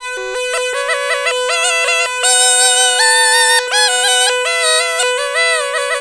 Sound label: PUNGI